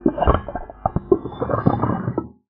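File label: glissando, special fx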